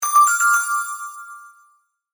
Multimedia Alarm, Technology, Multimedia, Alarm, Element; Alert Sound Effects; SoundEffects-BlastWave